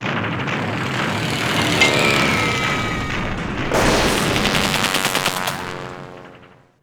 Atk Air to ship; Gary Grigsby's War in the East

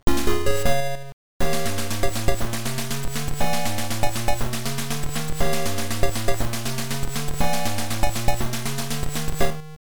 Mothership Landing; arcade; Tube Panic

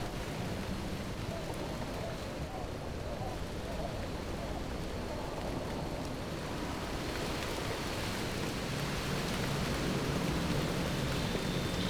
Ambience; Fallout Brotherhood of Steel; PlayStation 2 Xbox

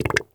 fart wet

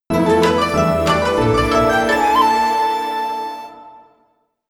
Jingle Recruit Travel Companion; Shiren the Wanderer The Mystery Dungeon of Serpentcoil Island Switch gamerip 2024

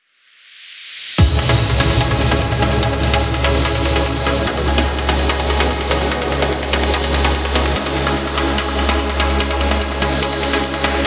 BGM Battle, gamerip; dusk diver 2; ps4 ps5 switch windows

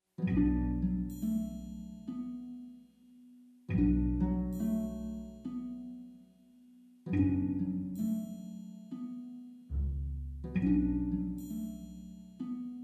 harp; Journey G Y I N T R O 2

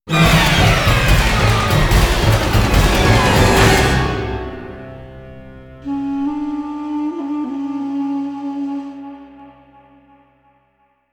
blow the bridge; Blacksite Area 51

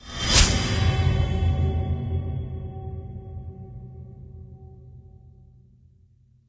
Swell; friday the 13th killer puzzle

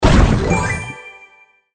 Jelly Cake Explode; Candy Crush Soda

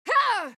Voices female attack shout

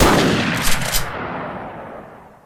Weapon fire single shotgun

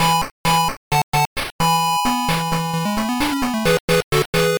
Unused Jingle; Kamen Rider 555; Pico

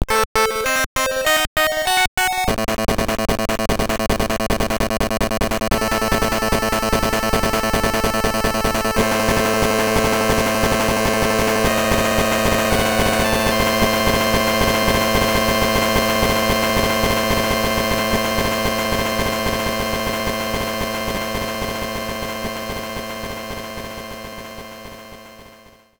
Death by Contact; donkey kong; gameboy

